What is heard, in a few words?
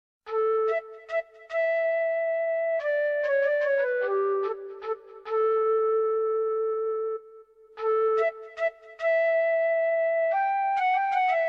alien flute; ringtone; sailor moon r the movie